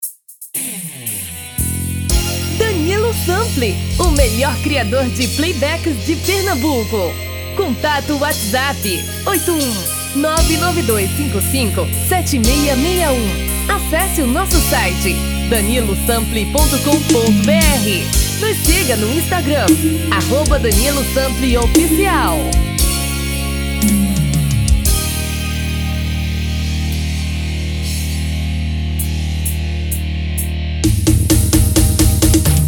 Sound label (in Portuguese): Axe